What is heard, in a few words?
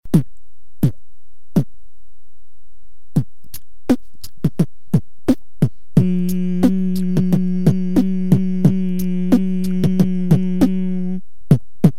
kick; basekick